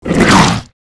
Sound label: CRAB SPIDER Hit